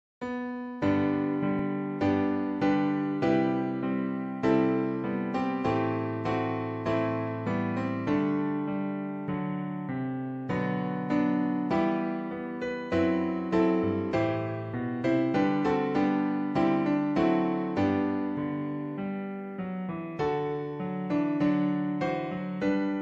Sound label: Regional Anthem; HWC's Nam Keian Autonomous Territories